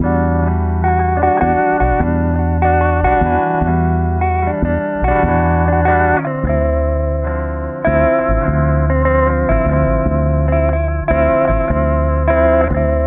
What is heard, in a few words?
guitar honor among thieves; Loops; Phonky